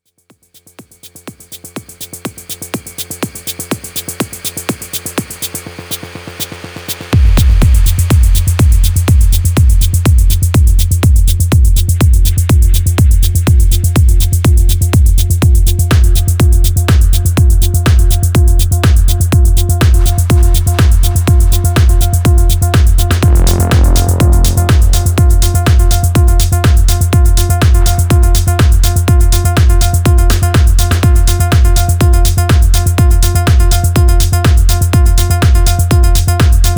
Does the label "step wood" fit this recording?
No